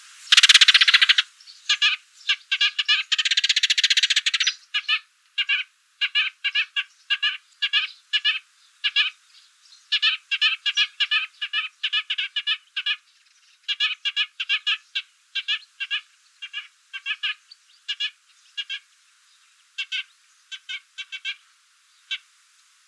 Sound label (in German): Vogelstimme Feldsperling Passer montanus call; Marco Dragonetti